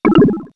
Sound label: giant shell kick; SNES; mario; SMRPG